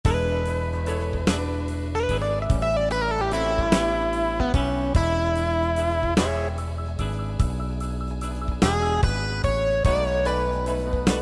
Slow Blues, Yamaha PSR-3000 R & B style; Factory Styles

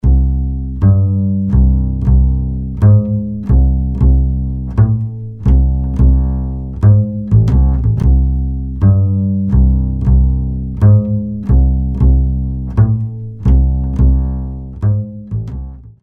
Cool Upright Bass; Apple GarageBand 2; Apple Loops

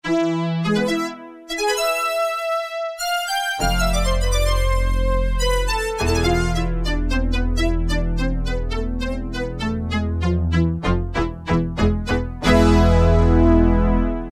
VIOLIN ATCK, Casio VZ-10M

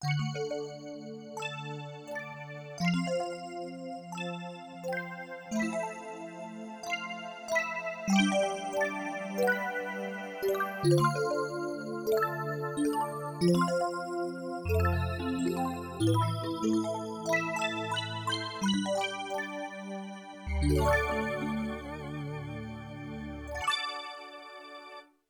Sparkling, Yamaha TX16W, Spacey Keys

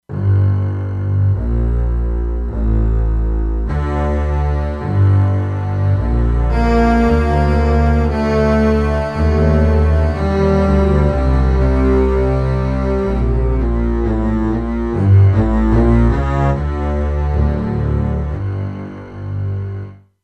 Arco Basses, E-mu Proteus-2; Factory Presets

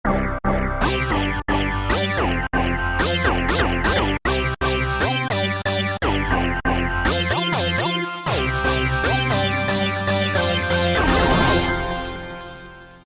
synth-Hard; E-mu Orbit V2; Factory presets

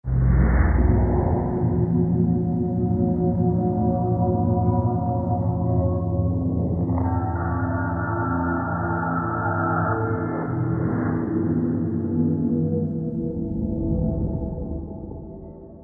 sub wave; Universal Sound Bank; Soundscan 14 Twisted Special FX

